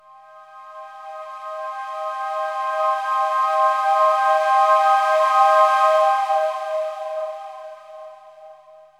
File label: SYNTH PAD AMBNT 160 C, Ambient; eJay; Sphere